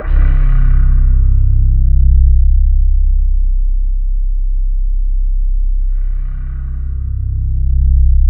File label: C2, Synth Leads